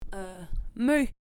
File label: Ahh